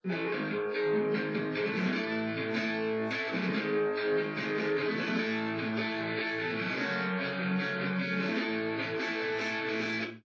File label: drums; SiSEC10; underdetermined